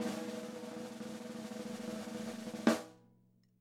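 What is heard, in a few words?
Snare Roll; Roland MV8800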